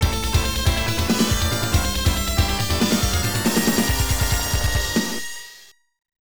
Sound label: Game Over (After Ending); Spider-Man The Videogame; System 32; Arcade